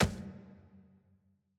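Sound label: ambience room polygon punchbag training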